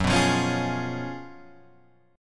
F sharp Major 7th Suspended 2nd Suspended 4th, Guitar, Open G